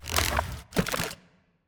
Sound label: tree golem little strike; Shelter